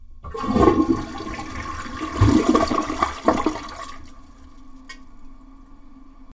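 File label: Toilet Flushes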